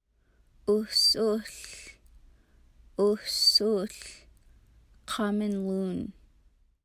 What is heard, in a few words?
Common Loon